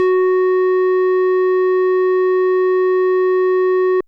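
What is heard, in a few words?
FM Sq Dist DX100 F#0; square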